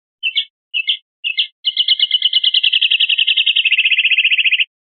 rep acanariado, pardillo

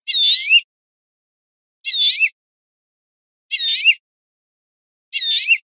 quejas mio, verderon

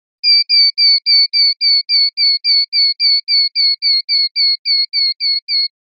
titeos

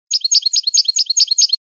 tableteos; campero

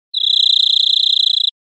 grilleras; verderon

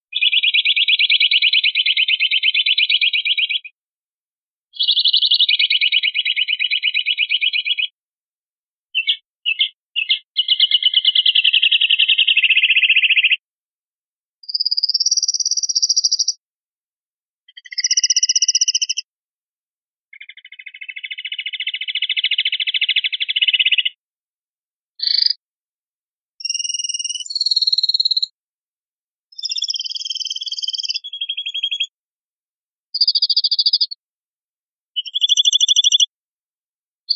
rep cantes acanariado, pardillo

